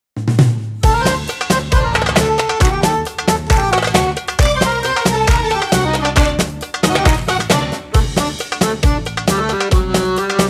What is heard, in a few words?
debka instrumental